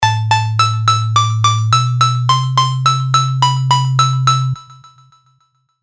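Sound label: Serum arpeggio, chiptune; Gun-Nac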